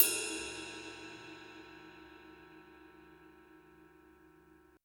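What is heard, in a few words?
CYM RIDE, ride cymbal; Sound & Vision - Gigapack I; mono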